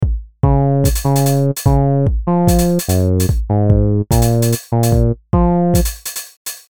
lead and drums

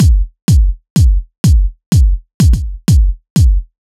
KICK

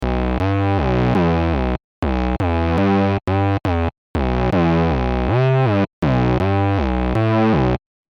es2 bass amp designer

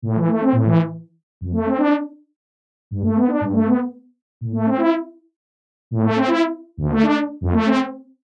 Harmonizer Effect

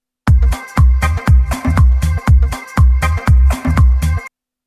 volca loop

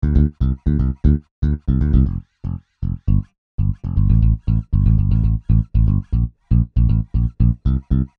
Bassline

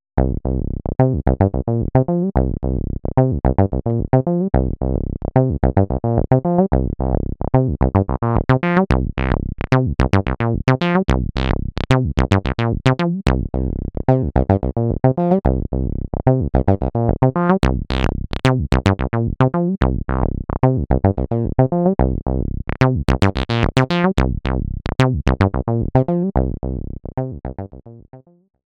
decay adjustment

